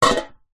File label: household bowl metal water inside set down